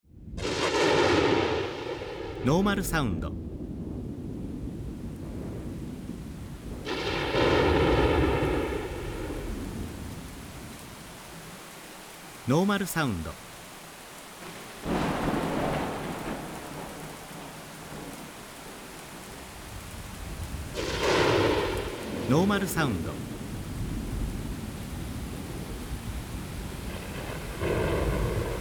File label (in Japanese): kaminari